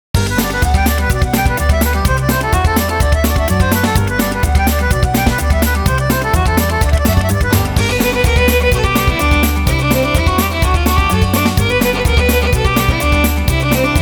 western park

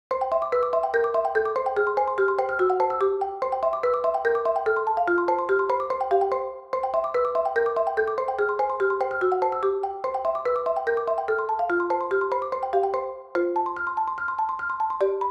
Dwarf cooking class, bgm

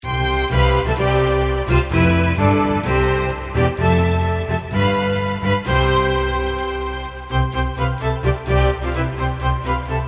Joy to the World! the Lord is come

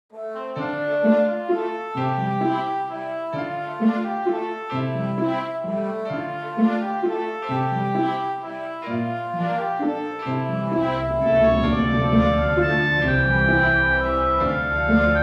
mysterious forest, BGM

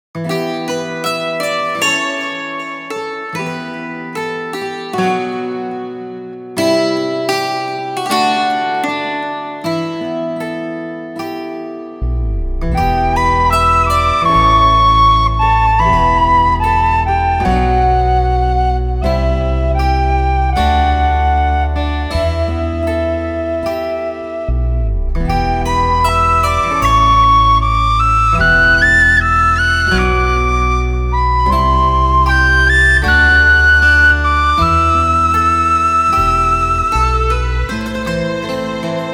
Ancient memory, bgm

